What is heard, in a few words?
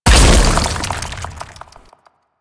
Infested Bone Blade Spike Burst B; Warframe